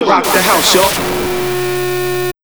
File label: HARD 130 FX, Big Beat Drumloops; USB Soundscan vol.25; AKAI